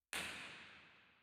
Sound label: Auditorium, Theatres; Fila27